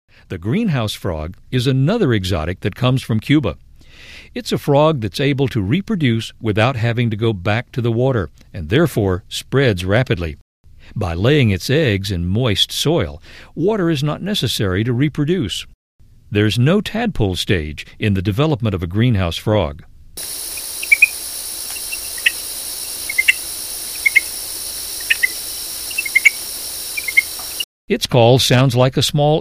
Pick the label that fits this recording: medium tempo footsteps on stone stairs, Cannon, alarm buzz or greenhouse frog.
greenhouse frog